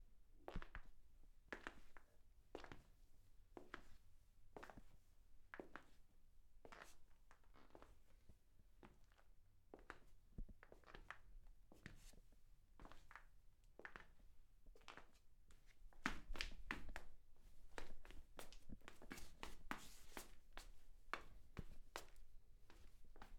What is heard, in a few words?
Old Leather Shoes